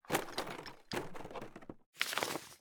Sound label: Looking For Time Capsule; LOOPERS